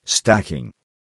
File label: Stacking